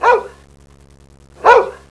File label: dog